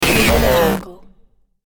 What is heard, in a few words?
static glitch technology